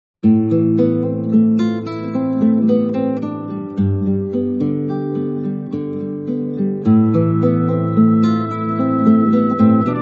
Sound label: Theme Instrumental